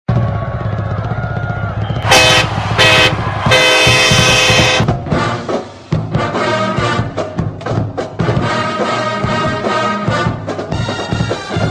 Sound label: fight song w train horn; stanford